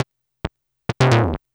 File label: Percussive